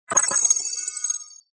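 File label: interference in a space computer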